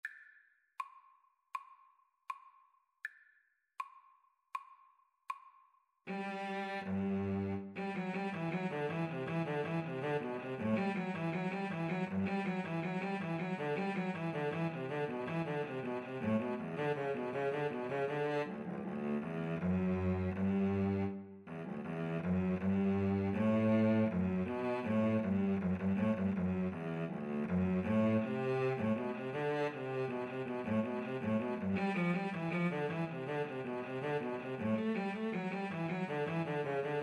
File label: bach invention 5 bwv 776, Viola Cello Duet